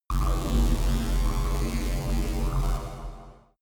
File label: space working machines